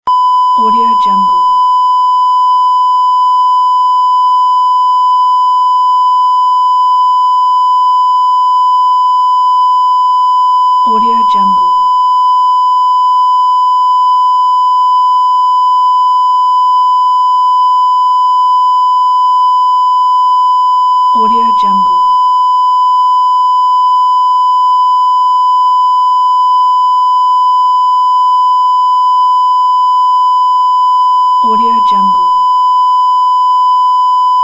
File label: long censorship beep